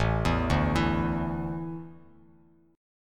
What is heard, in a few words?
A Suspended 4th, Bass Standard; Asus4 arpeggiated; JGuitar